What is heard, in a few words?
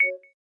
Connected; Samsung Galaxy Tab E 7.0 SM-T116BU; SideSync